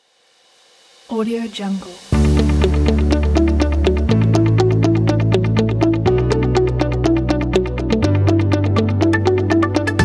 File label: motivate party